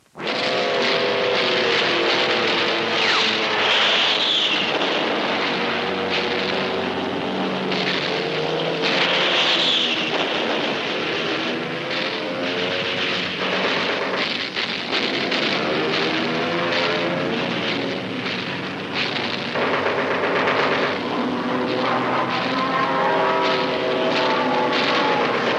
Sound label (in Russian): vozdushnij nalet fon